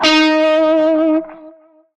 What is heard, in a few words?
Shawzin Flourish Oct Three D Sharp; Music; Warframe; Narmer Shawzin